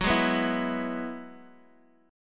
F sharp 7th Suspended 2nd Suspended 4th F sharp down Guitar DADGAD